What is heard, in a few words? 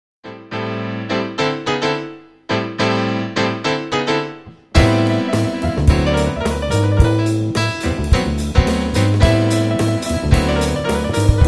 welikefunkyjazz